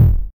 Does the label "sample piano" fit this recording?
No